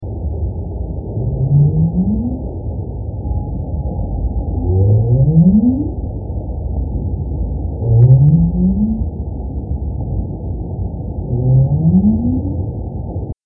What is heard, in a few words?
North Atlantic Right Whale